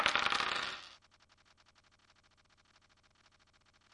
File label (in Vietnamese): Tieng xuc xac lan tren mat ban